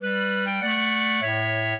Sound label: clarinet; Mozart